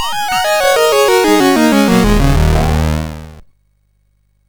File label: Eon sid MOS6581R2